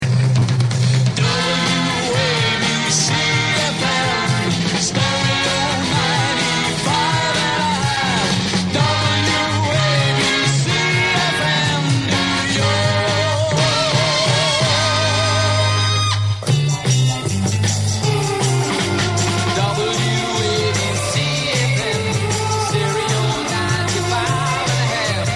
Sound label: Jingle; WABCFM 1970